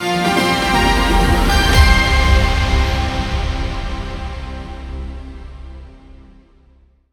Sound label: free spin music outro; spinsane